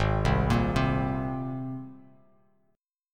G sharp Major Add 9th G sharp, Bass Standard; G#add9 arpeggiated; JGuitar

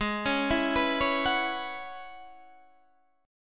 F sharp 7th Suspended 2nd Suspended 4th, G sharp

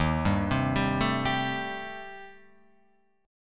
Eb 6th Flat 5th Eb, Guitar Open F; arpeggiated; 3,2,0,2,0,2

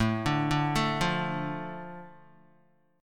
A Suspended 4th Sharp 5th down Guitar; Asus4#5 arpeggiated; JGuitar

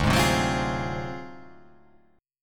D 7th Sharp 9th Flat 5th D down, chord

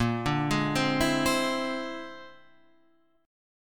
Bb 7th Sharp 11th Bb; Bb7#11 arpeggiated; JGuitar

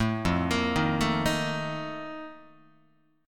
F 11th F, down, Guitar; F11 arpeggiated

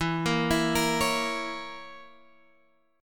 C sharp Minor 6th E

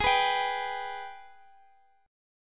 Gsharp Minor Major 7th, Guitar, Half Step Down

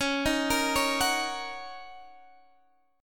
Gb 6th Db

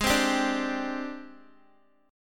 Ab Major 7th Flat 5th Ab, down, Guitar

